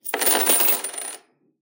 coins drop table; soundscrate

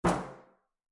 Metal Footfall; soundscrate

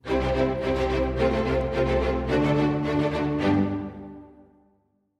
Friendly Ident Strings; SoundsCrate

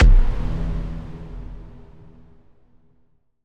Epic Hit; soundscrate

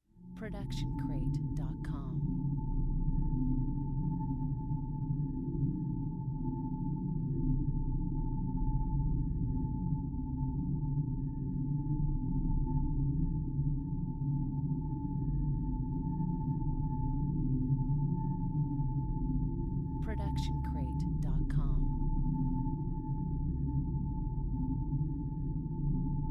Sci-Fi Horror Ambience; SoundsCrate

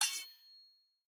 Sword Clang; soundscrate

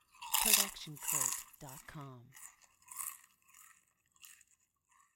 chip crunch chewing open; soundscrate

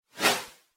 Text Message Woosh; SoundsCrate

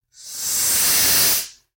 snake hiss

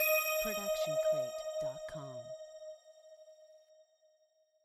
tonal blip; soundscrate

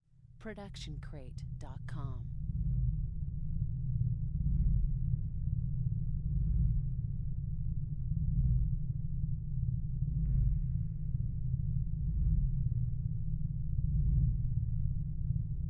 abandoned spaceship pulse; soundscrate

